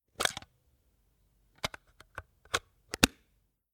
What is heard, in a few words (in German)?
sound Gehaeuse Oeffnen